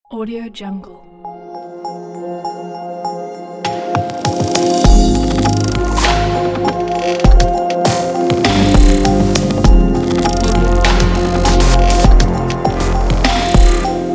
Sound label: glitch openning logo